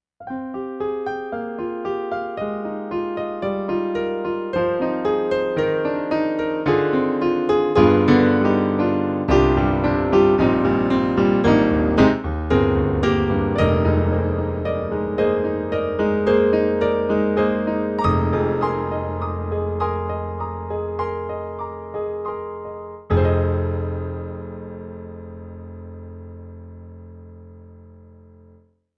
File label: MOTIF Jazz Grand